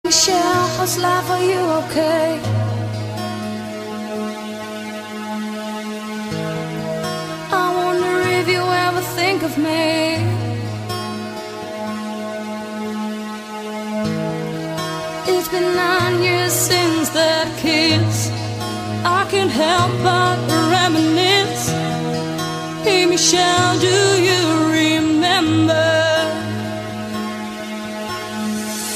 Rock

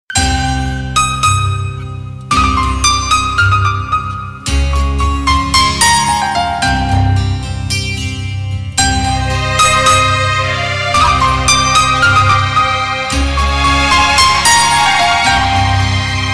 awesome piano, reggae; ringtones

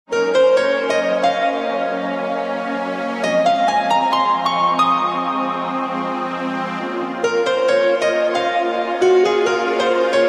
Harmonics

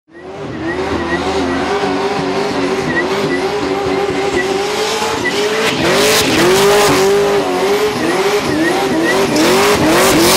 v8 burnout

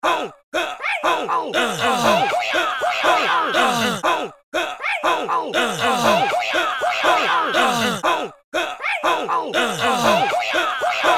Karate Chop

